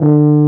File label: F-TUBA D0; Best Service; Alpine Volksmusik